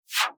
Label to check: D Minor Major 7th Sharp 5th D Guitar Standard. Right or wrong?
Wrong